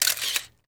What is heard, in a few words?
SHUTTER